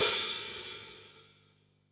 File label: Open Hats, Cymbals